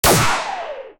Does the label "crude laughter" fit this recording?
No